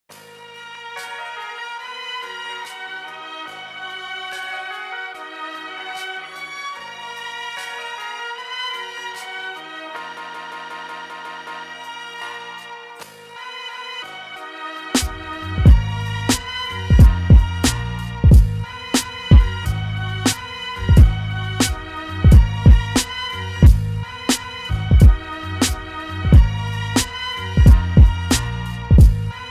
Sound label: Beat